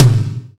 wet tom drum single hit c key, Royality free tom sample tuned to the C note.